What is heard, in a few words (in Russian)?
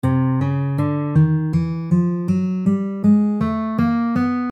Hromaticheskij zvukoryad v muzyke